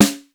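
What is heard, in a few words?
BIRCH snare drum; East West; Global Sounds; R8M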